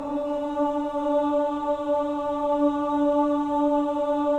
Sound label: CHO AH, Choir of Ahs; Roland - LA Composer vol. 1